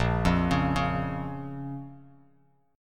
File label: Bb Minor Bb down, Bass Standard, chord; arpeggiated; JGuitar